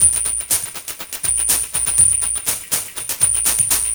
Squeek; Sonic Foundry (Sony Creative Software) - Electronic Point Blank

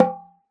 oxaca, percussion; ILIO

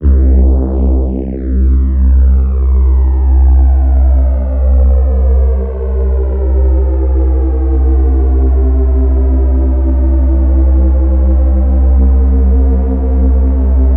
bass reese C; casca; M8; M8JUNGLEPAK1

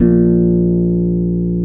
concert harp A#0; InVision Interactive; Lightware VOL-1; Instruments & Percussions

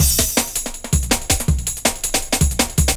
breaks 162

